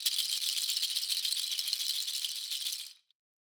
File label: rattles Roll, Raw Perc One Shots; Slate; SD SEISMIC Drum Sample Pack